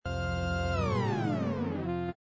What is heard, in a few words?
keyhole